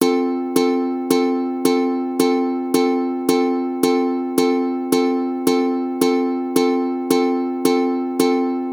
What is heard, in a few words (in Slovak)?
Rytmy ukulele pochod